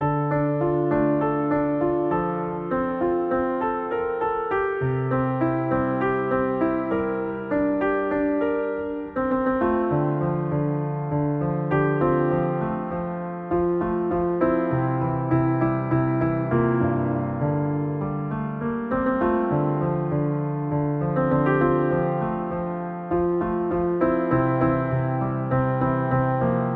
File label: Piano